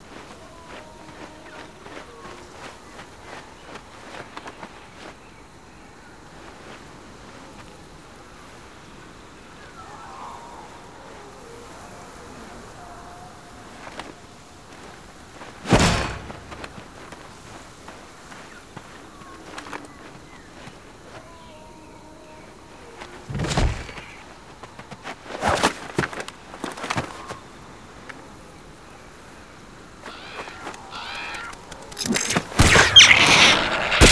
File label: jetpack; giants